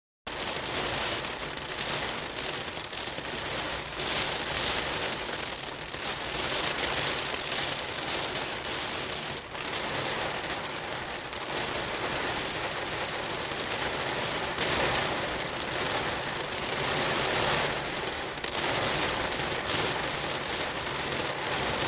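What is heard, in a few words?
Rain On Window Closeup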